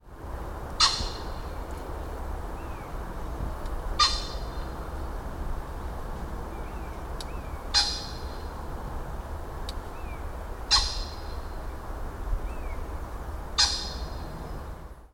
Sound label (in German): fasan